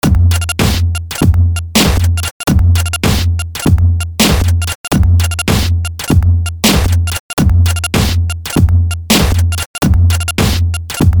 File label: beat